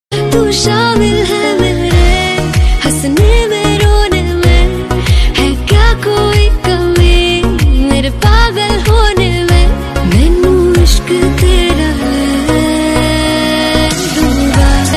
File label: new female version love sad song whatsapp status hindi ringtone new female status